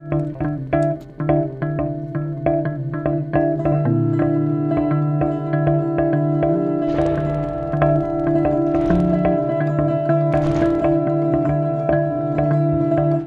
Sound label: rhythm; pre mix